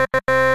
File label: game error